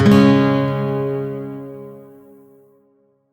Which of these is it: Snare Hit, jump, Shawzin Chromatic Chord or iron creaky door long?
Shawzin Chromatic Chord